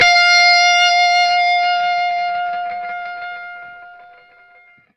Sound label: SINGLE F 5, Vintage Blues Guitar ELEC.GTR; USB Soundscan vol.22